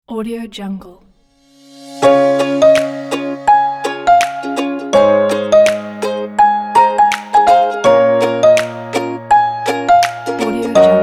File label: acoustic inspiring music for kids